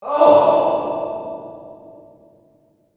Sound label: inmate agony